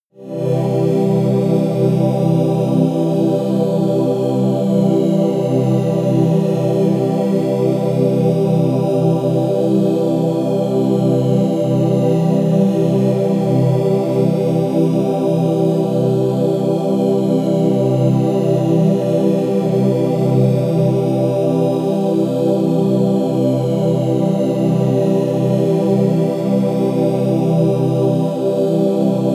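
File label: angel air, pads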